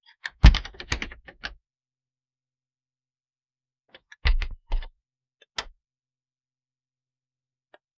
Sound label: silence followed by a sub, door